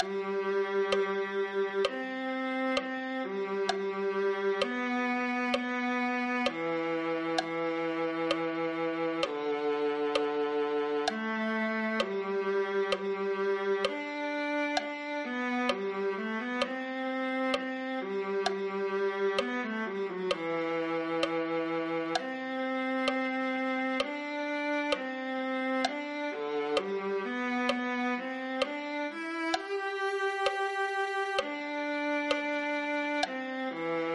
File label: viola